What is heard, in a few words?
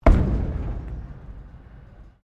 METAL IMPACT THUD; Materials